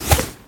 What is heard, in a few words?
whoosh nylon; Ice Age Scrat's Nutty Adventure